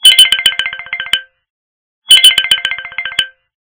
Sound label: Sms Ringtone